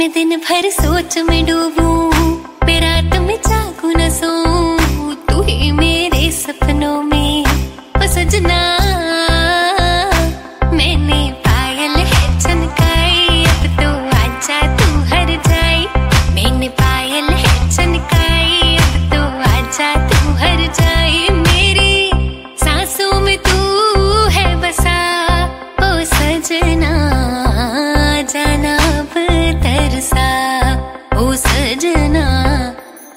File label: Female Song Ringtone